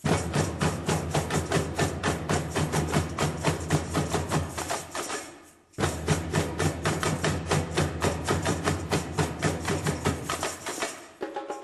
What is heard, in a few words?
batucada